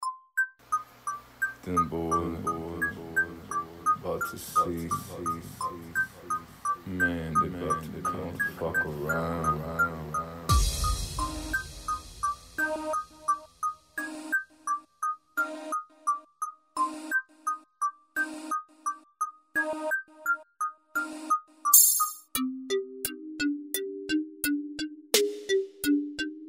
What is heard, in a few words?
BEAT; BEAT CONTEST (alpha 4)